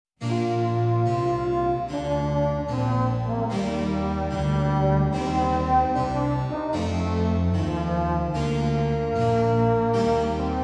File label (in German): Sonate in g-Moll Adagio